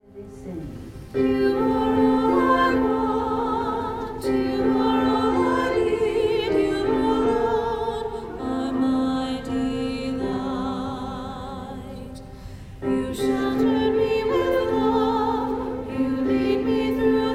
alto and soprano; Gather Hymnal

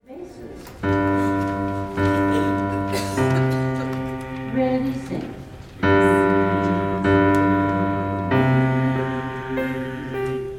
bass refrain

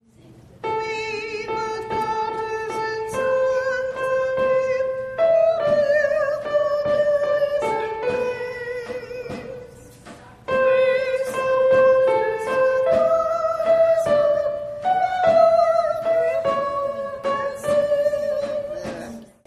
descant; Gather Hymnal

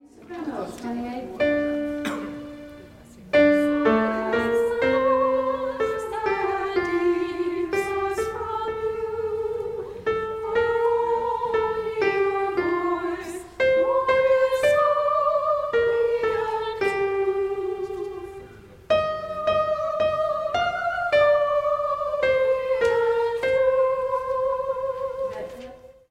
soprano

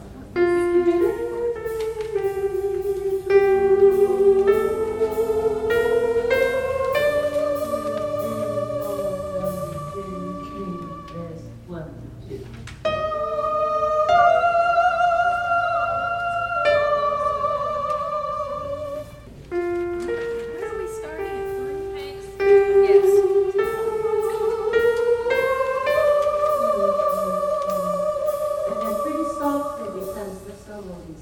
soprano

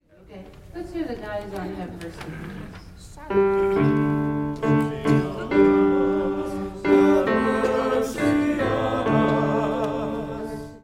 bass and tenor; Advent Lamb of God

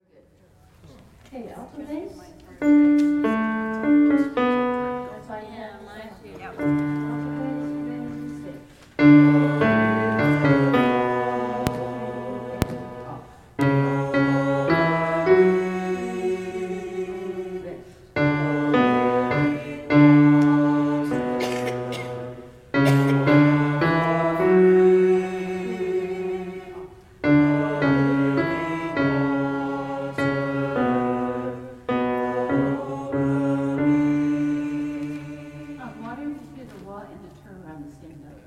alto and bass - then all

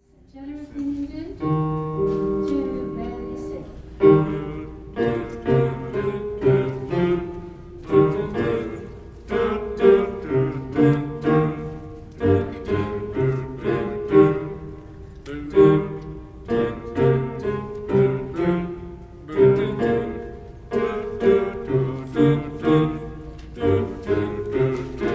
tenor and bass on doot; 641 Love Divine All Loves Excelling; Gather Hymnal

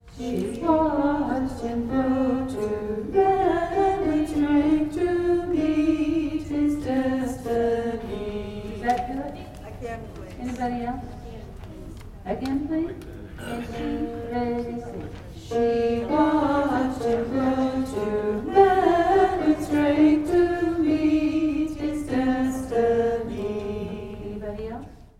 alto

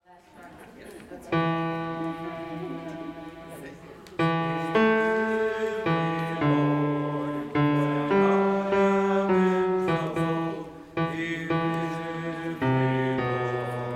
bass refrain; Gather Hymnal

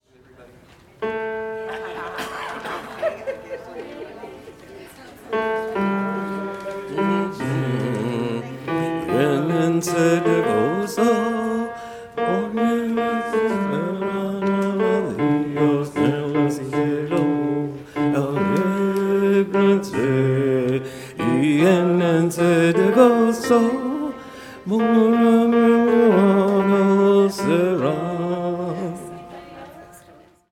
bass

